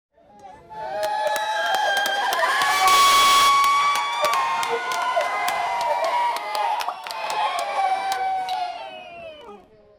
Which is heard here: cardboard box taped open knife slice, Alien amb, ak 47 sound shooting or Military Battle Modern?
ak 47 sound shooting